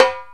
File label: Timbale Hi Rim; Planet Earth; Digital Sound Factory Planet Earth